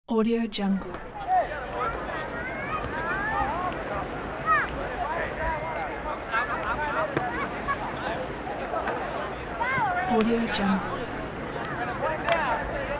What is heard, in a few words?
small beach volleyball crowd idle